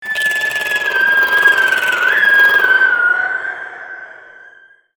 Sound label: Spider Boss Baby Scream; creatures mechanical